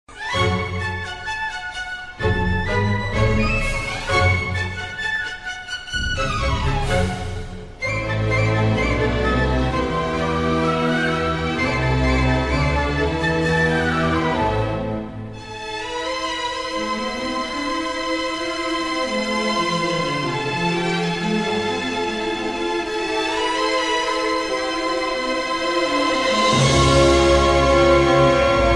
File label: music